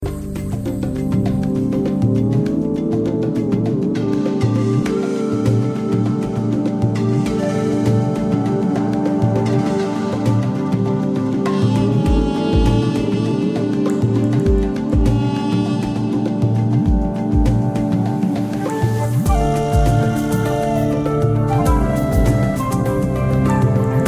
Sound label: Tranquil